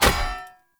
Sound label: Sphere Polearm Medium Impact Default, Weapon Impacts Environment; Player